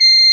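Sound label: C5 SHENG, CHINESE SHENG; AKAI S6000 CD-ROM; WorldSounds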